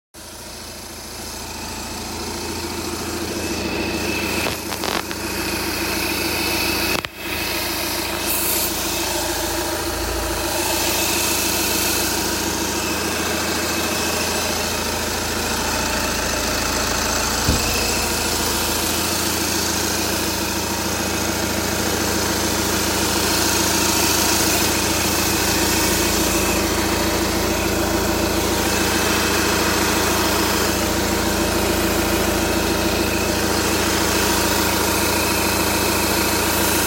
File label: audi a4 2011 tdi started making weird noise